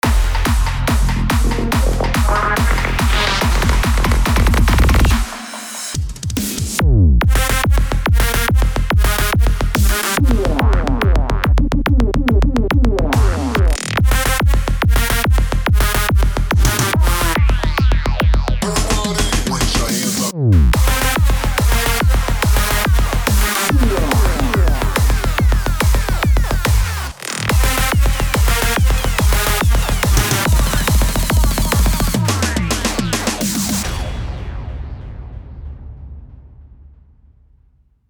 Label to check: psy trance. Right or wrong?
Right